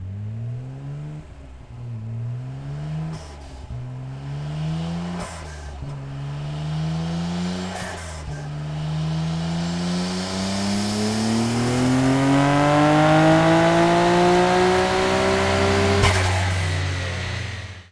Skyline R34

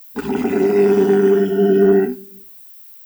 Walrus Pacific

